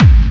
Bassdrums Clubby Kicks; Vengeance Samples

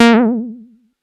Bass Juno B; Vengeance Samples

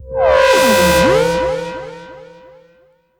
Tonal FX C

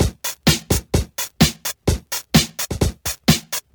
Minimal Loops

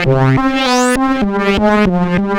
Roland C Line, Roland S100

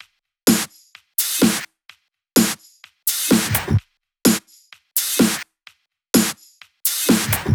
127BPM Kit; Vengeance Samples; Warhog Kit

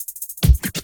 Loops Fillin140; Vengeance Samples; VEC1 Fills

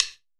Percussion; Vengeance Samples; VEE Percussion Instruments

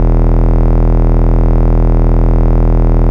Root E, Bass

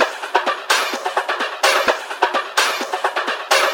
Percussive Loops 128BPM; Vengeance Samples

